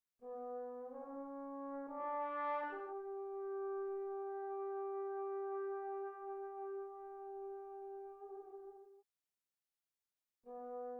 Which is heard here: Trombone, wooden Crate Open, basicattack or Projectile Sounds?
Trombone